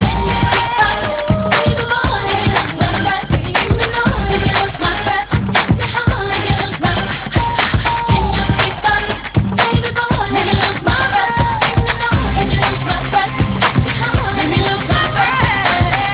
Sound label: bbox